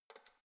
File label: letterbox metal turning key